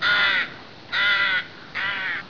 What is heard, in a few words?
ROOK